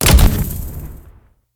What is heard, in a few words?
p atk grenade